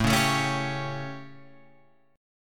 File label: A 7th Guitar Open F, chord; strummed; JGuitar